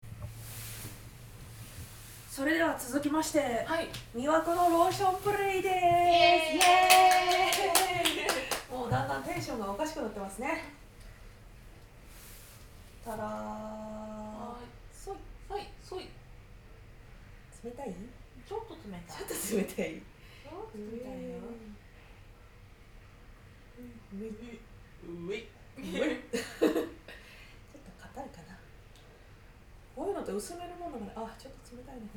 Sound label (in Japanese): ローション貝合わせ; 本編ステレオ収録